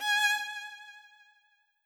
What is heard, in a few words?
violin